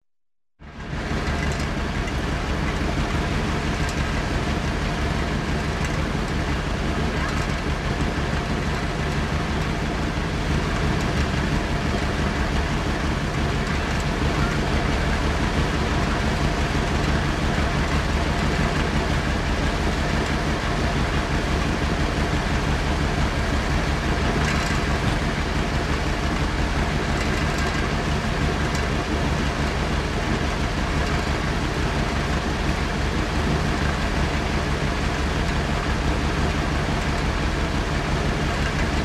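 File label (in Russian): двигатель средней мощности на холостом ходу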